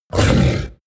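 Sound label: coloss growl deep short, Creatures; kreaturen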